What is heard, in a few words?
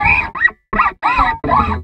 Guitar Fret Noise